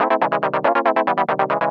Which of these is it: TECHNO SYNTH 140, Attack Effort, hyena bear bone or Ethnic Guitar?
TECHNO SYNTH 140